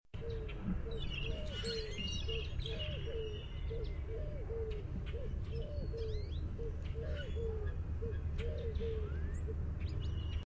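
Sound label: Mourning Dove coo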